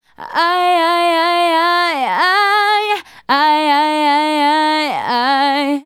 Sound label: Female Solo, Vocal